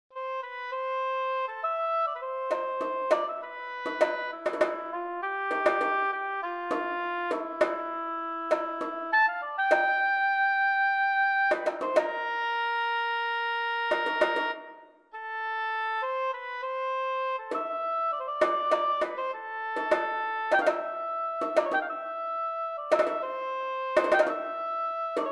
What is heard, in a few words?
canon for melodic instrument and drums